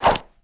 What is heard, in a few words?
spanner swing; weapons